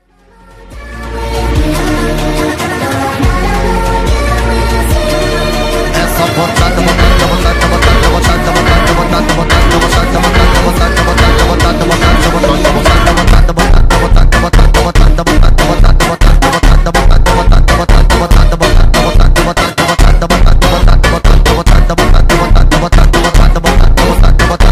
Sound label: Sleep Well Funk speed Up